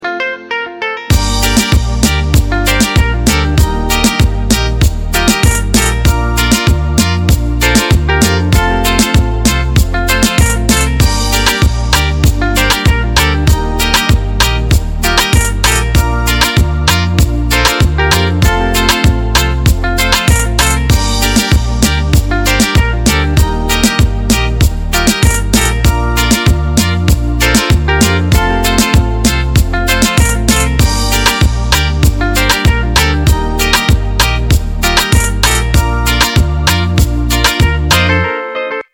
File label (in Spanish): instrumental